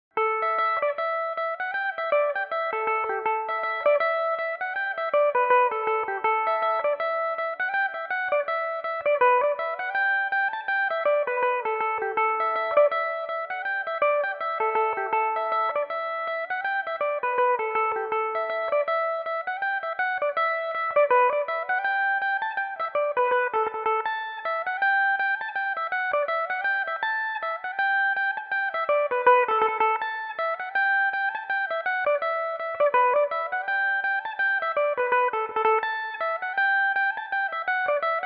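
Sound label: A mixolydian, irish mandolin; dandy denny cronin; belmuse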